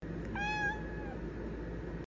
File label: meow; komaru